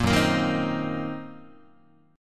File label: Gb 6th Flat 5th Gb down Guitar; strummed; Gb6b5; 1,2,1,1,4,2; JGuitar